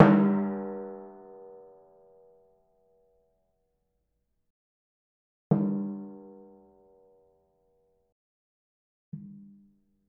Timpani 奏, 膜鳴楽器 打奏; Timpani-奏法と打叩強度による違

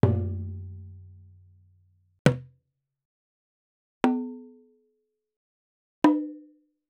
和太鼓 長胴 桶胴 能; 膜鳴楽器; 打奏; 附け締め太鼓

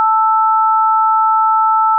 正弦波（定常）2本, 仮想ピッチ; 音の高さ

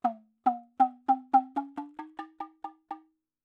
小鼓音高変化 小鼓; 膜鳴楽器; 打奏; 小鼓上昇グリッサンド

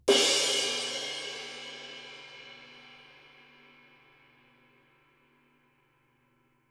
シンバル; 体鳴楽器; 打奏

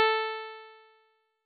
調波構造音（10～30本） 高域, 仮想ピッチ; 音の高さ; 高域下がり・時間減衰波

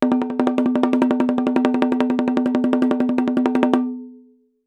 連打 能太鼓; 膜鳴楽器; 打奏

